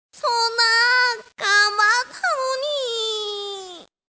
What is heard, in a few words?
shutdown; mushitama